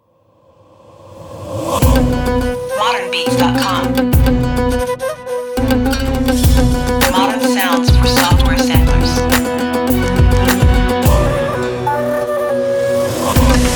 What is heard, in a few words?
Ethnic Music Chopz